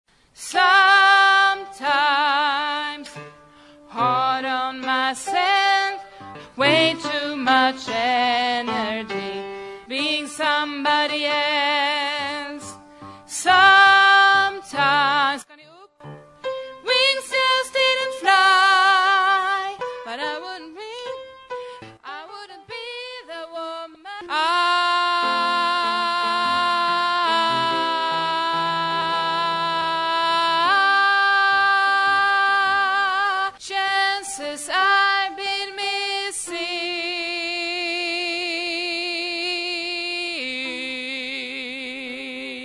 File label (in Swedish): bas, stammor